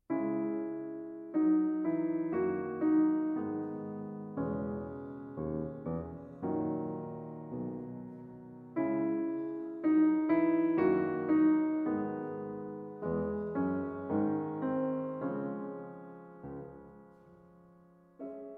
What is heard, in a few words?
Theme, Variations & Fugue in C-Sharp Minor For Piano